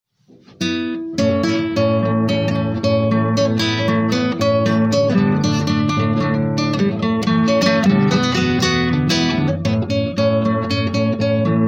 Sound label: Jingle Bells Originale